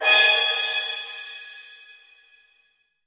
strident orient cymbal

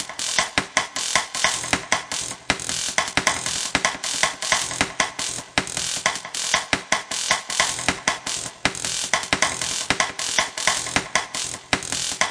078 Found Percussion; 074 to 128 bpm

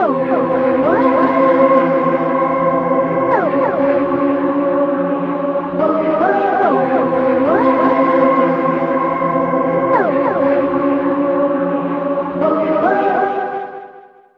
gliding synth intro; SYNTH AND ELECTRONIC

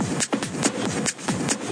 140 thick techno noisefloor, drum loops

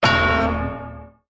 cartoon hit; Cartoon FX

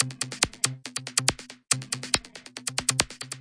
140 bpm, super electro, drum loops and breaks

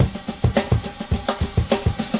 108, drum loops

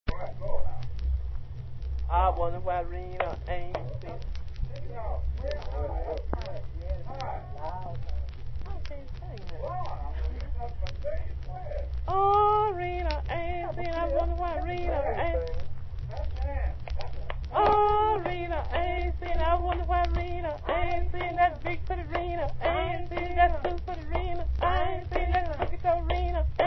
clapping song; PUBLIC DOMAIN MUSIC; 1939; jr lomax

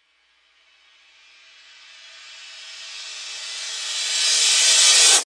patient backwards crash

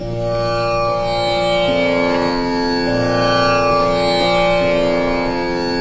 Tamboura Ma, Far East Drones and Plucks

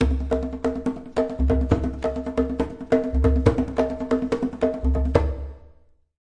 139 epic congas, drum loops and breaks

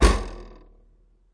bouncy vibration, Typewriter Kit

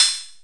Korg KPR-77; DRUM MACHINES

